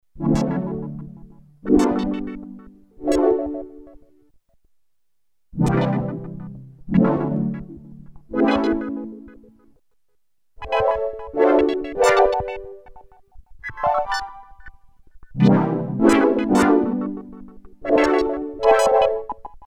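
chill, Red Sound Darkstar Desktop digital synthesizer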